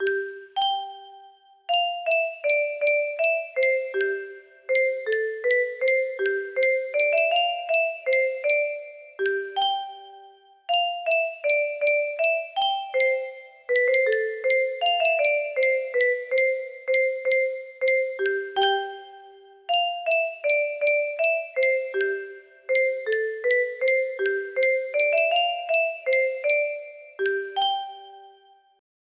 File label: music box